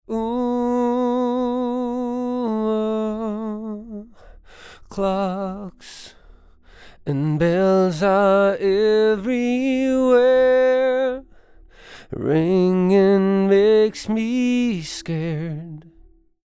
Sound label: MALE VOC EQ BYPASS